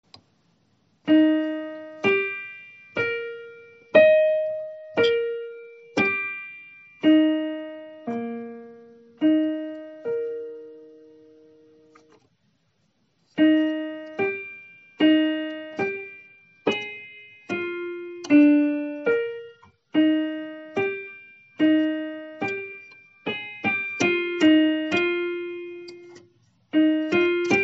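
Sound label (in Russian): без аккомпанемента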